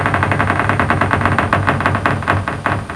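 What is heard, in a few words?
creaking; HAUSHALT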